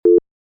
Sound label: call Cancel